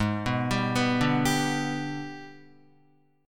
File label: Ab Minor Major 7th Flat 5th, down, Guitar; AbmM7b5; arpeggiated